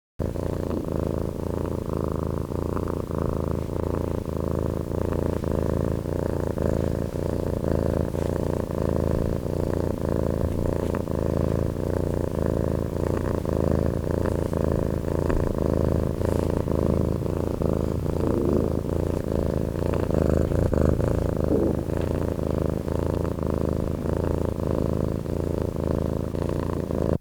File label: domestic cat felis catus, zvuki murchaniya koshek